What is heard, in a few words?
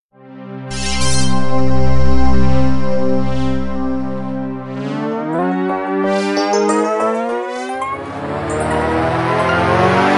retro futurism